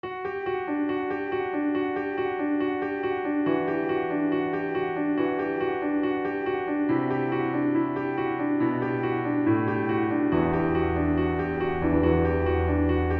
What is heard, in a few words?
abstract tension